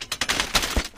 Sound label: taunt moped end shake; video games; source engine; tf